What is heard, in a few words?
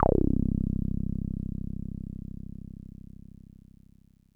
TB C1; Music Store Sound Service; Einstein